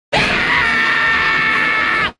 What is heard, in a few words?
screaming; stimpy